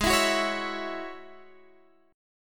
G sharp 7th Suspended 4th Sharp 5th, Guitar, Half Step Down